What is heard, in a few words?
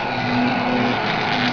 weld sound; menutron